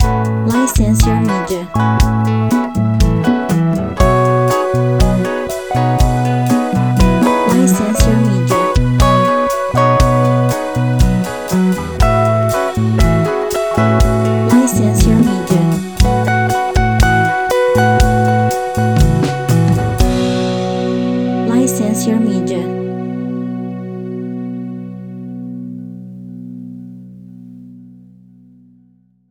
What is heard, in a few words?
Bossa